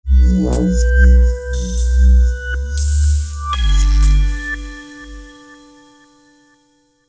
minimal design logo

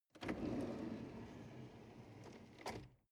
drawer medium open draw long

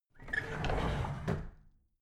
drawer big squeak close regular

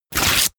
sheet crumpled tear up fast

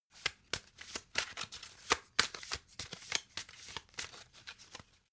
playing cards shuffle slow

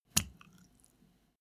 step barefoot wet hard

